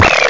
ghost; boomin' eck!

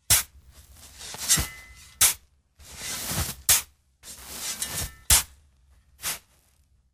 digging

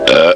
burp

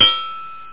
ting; percussion

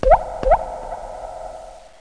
water; mordor

